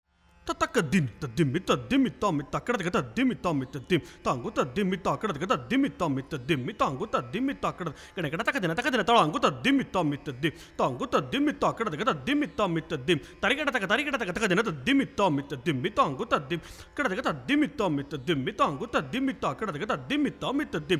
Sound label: konnakol